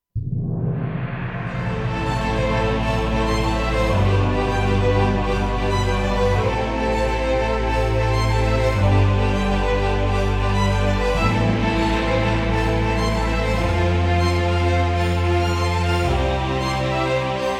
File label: RPG Battle Climax; action